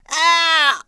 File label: HURT; TrueCrimeStreetsOfLA; XBOX